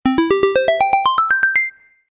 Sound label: Game App Success, Video Game Sound Effects